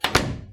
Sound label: door close